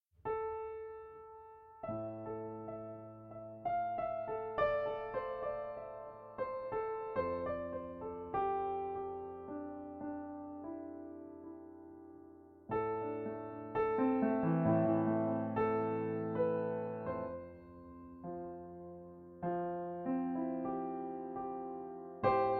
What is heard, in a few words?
Steinway D tuning